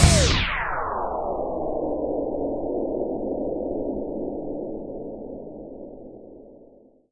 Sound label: factory fail